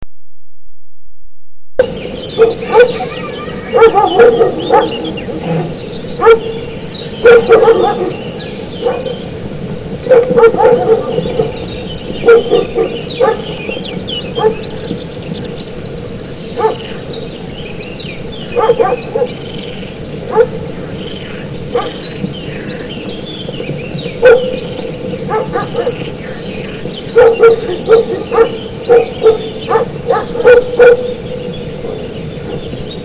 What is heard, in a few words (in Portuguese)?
husky e pastor belg